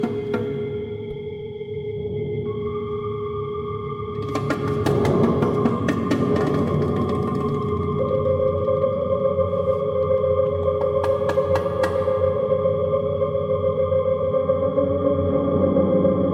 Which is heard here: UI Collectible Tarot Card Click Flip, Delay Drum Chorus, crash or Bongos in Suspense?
Bongos in Suspense